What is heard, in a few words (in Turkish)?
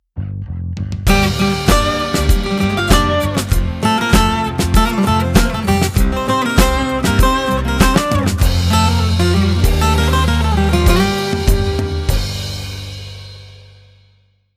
gitar